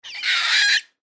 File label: peacock squawk